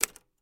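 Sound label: Typewriter Single Key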